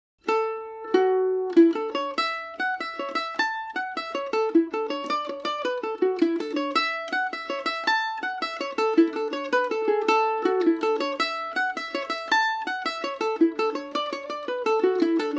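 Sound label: A major, Irish mandolin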